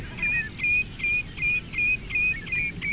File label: Red Shank Tringa totanus